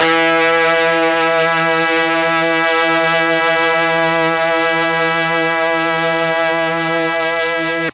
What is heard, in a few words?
E2 brass, mellotron